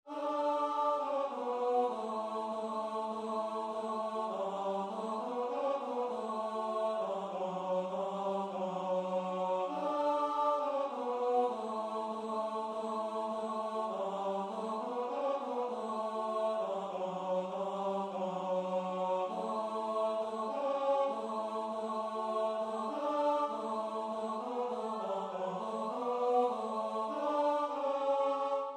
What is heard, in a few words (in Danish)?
tenor; Deck the Hall